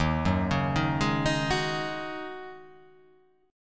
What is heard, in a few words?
D sharp Minor 11th D sharp, chord